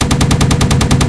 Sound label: Automatic Gun 2A42, Weapons; DCS